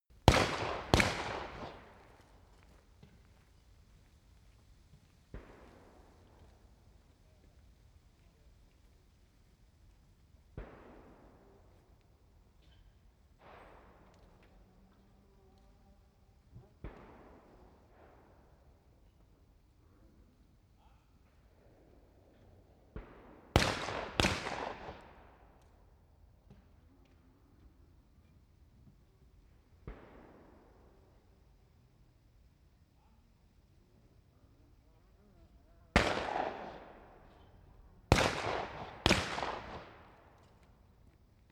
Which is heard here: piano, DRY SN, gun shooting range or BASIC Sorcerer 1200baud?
gun shooting range